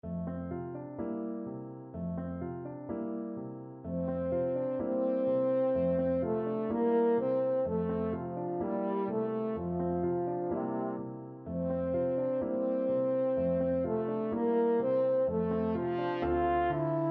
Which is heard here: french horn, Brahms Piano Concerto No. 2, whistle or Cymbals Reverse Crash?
french horn